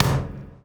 DOWN, DOWN KIT 78; AMG Gota Yashiki Groove Activator

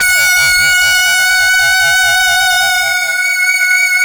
LEADS; Masterbits; Vintage Voltage

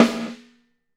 GATED, snares; Sampleheads - New York City Drumworks VOL-1

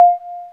EP worm, Hip Hop; E-MU Formula 4000 Series Vol. 1; Hip Hop Nation

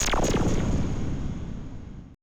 AMBI FX

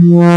ppg saw-sweep, wavetables

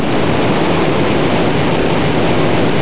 rain, synths; Rubber Chicken Software Sample Bank

